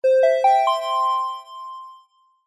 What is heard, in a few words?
public places pa system tannoy bell electronic chime dry